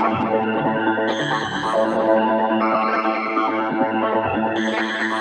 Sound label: A 138 BPM, Arp Loops, Psytrance; Feelit